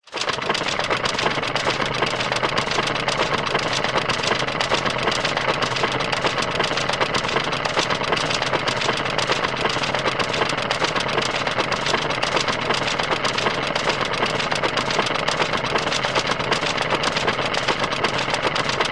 leisure roller coaster hill lift chain pull operate